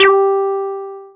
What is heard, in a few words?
Synths BASS